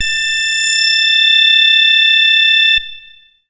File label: MASS LEAD, SYNTH; Keith Emerson Signature Series